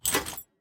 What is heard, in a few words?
Buy Unit